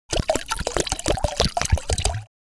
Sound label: Water pour into plastic bucket